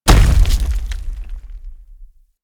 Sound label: Infested Step Default, Enemies; Warframe; Femur